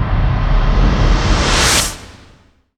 DUESEN FX; Best Service; Advanced Mega Effects

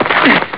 fall hin; Amiga Soundtracker sample

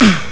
stoehn; Amiga Soundtracker Sample Packs

